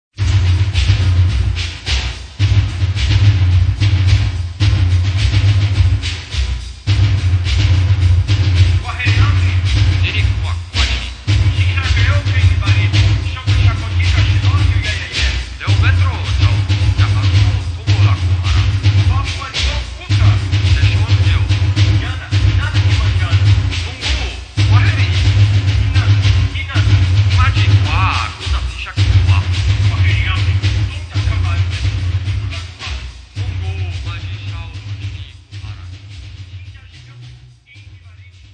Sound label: tambores de marvaro